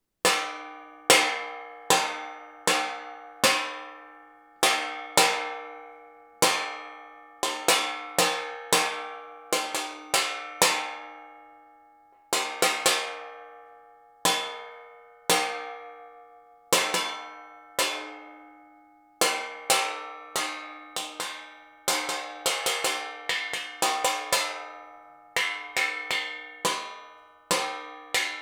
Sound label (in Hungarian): fémtálca, fakanál kerek végével való ütögetés hátulról; perkupa; hangkeltes 2015